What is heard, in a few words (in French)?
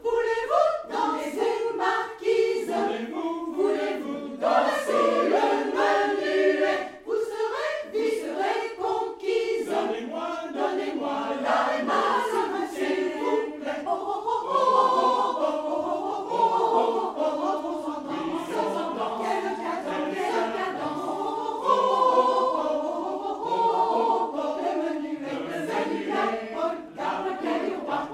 extrait polka